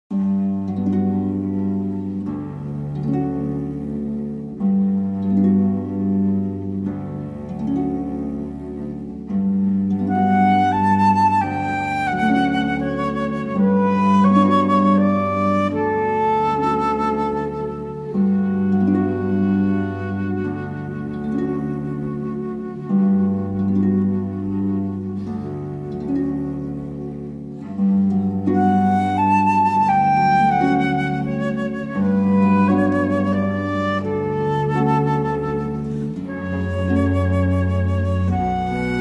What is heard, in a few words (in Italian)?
arpa flauto violoncel; Gymnopedie no1